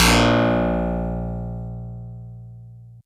Harpsi FM DX100 As1

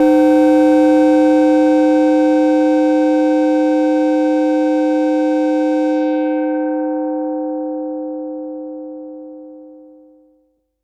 SYNTH Purity

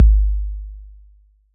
BD 808 Decay

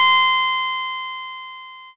Noise

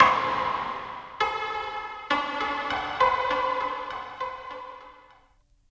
Sub37 Stab Delay Vinyl Synths C3; vinyl perc

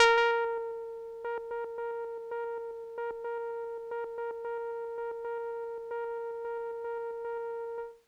Kawaii As3; keys

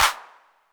Clap 808 Saturated B; Hand Clap; Multi Saturated

